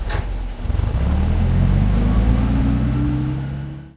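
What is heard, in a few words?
centurion engine interior start, tanks; War Thunder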